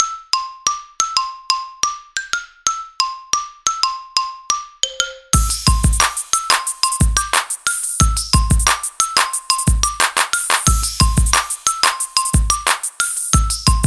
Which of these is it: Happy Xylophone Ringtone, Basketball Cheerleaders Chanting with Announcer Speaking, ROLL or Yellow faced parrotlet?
Happy Xylophone Ringtone